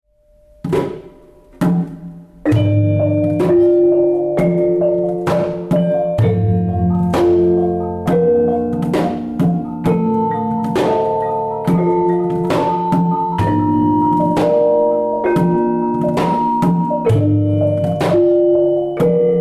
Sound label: GAMELAN